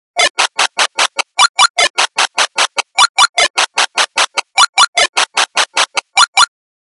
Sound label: 8bit; RingTones